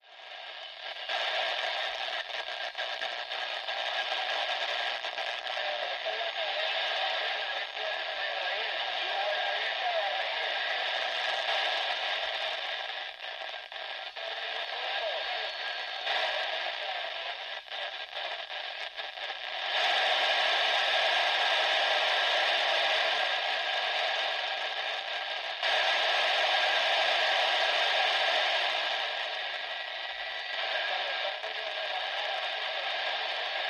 Futz Radio: Radio Hash And Static With Some Indistinct Voices